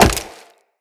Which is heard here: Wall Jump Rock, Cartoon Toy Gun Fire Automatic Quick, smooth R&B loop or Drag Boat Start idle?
Wall Jump Rock